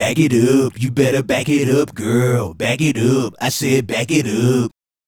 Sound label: Male Group, Hip-Hop and RnB Vocals